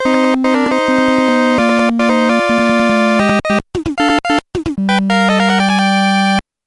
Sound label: start